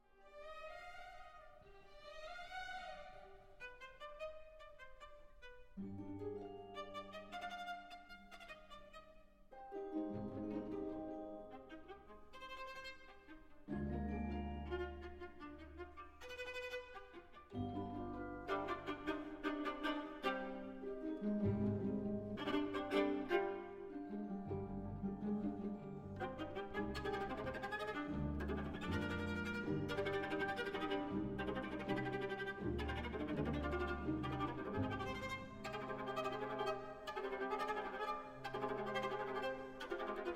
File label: Chaconne for Viola; solo with orchestra